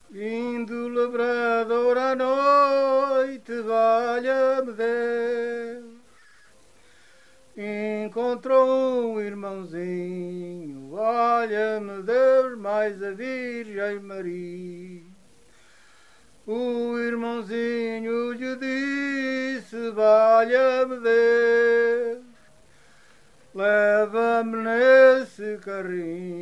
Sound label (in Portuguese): Romance